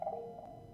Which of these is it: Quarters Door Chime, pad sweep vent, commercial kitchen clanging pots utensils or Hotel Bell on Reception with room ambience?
Quarters Door Chime